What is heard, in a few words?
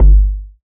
kick one shot